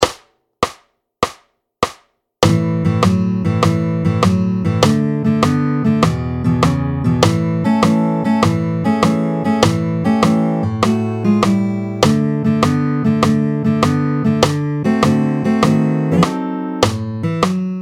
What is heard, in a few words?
Style Routine